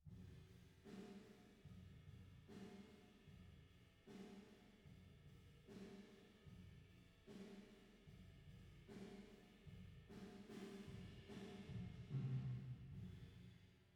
Piano

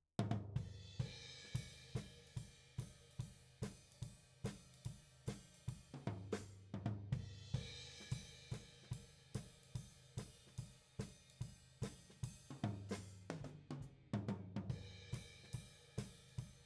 Tom

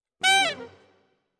Alto, RNR for piano

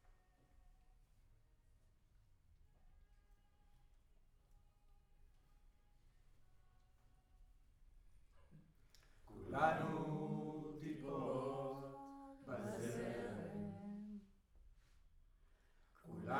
Room, Choir

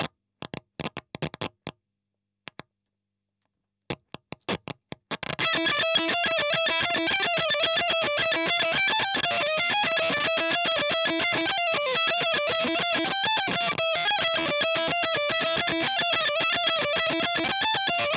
EL Fuzz End

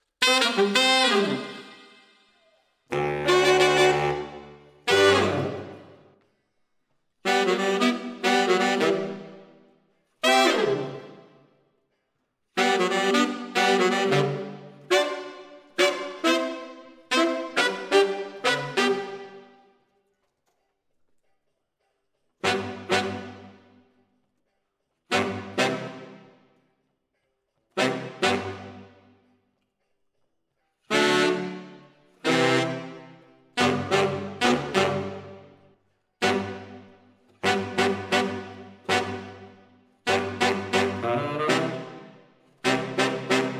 SAXES

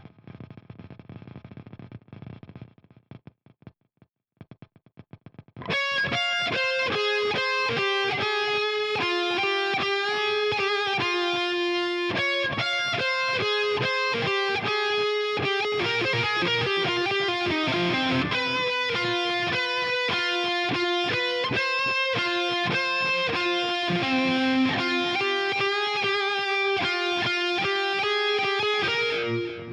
Guitar

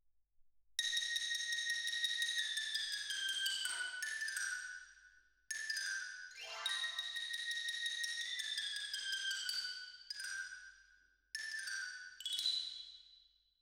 Xylo

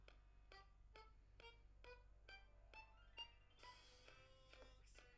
Accordeon MAIN

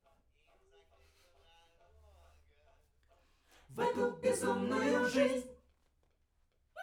Backs SCHOEPS

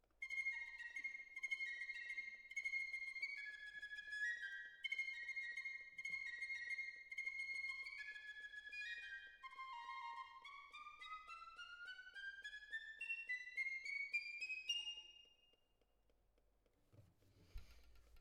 Cello Solo

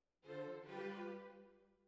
Clarinet

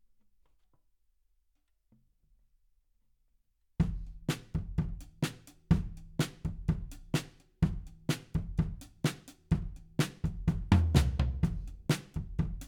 Kit

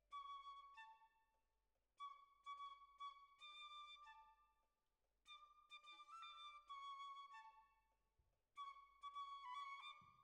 Oboe

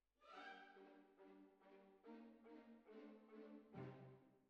Cbasses

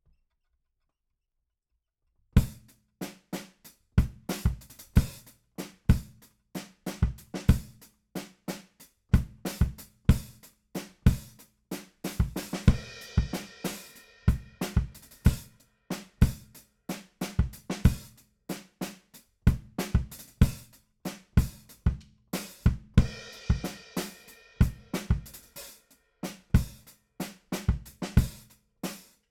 BD Out

